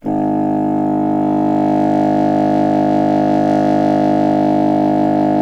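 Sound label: wind contrabassoon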